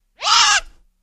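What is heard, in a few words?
Bat Screech Short Rasp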